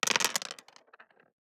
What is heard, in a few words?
DICE on Plastic, Throw and Roll, Standard, 5 Five Dice; Samples; ArticulatedSounds; DICE Sfx Party (Extended)